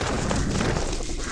Slug walk

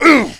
Windy Giant Damage

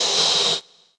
walk

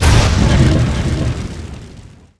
Fire Ball; Seer; GamigoNA

